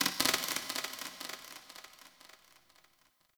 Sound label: Corpus Ship Metal Knocks Texture Small